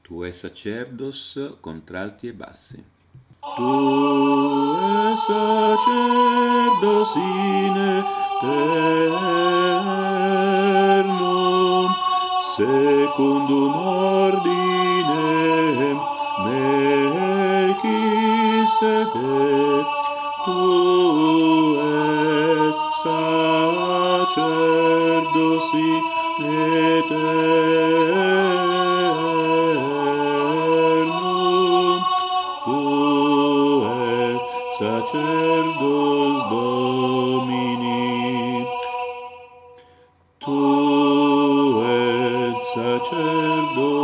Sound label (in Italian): Tu es Sacerdos Bassi, Coro